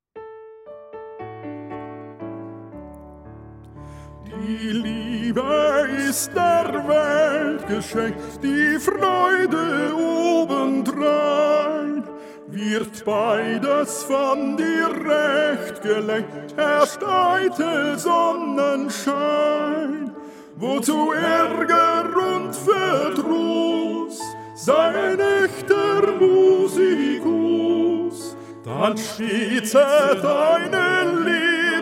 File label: TTB Tenor1; maenner